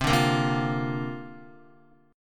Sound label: C 7th Suspended 2nd Suspended 4th C down Guitar; C7sus2sus4; strummed; JGuitar